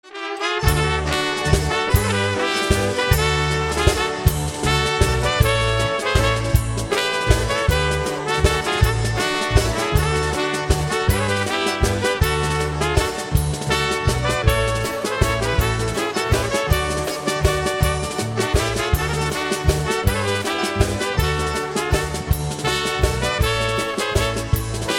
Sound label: Waltz